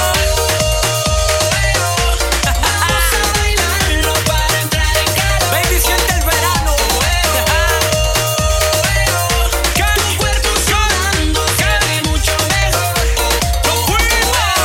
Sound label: latin explosion; multitrax